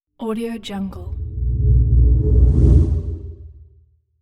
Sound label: spaceship airy phased pass by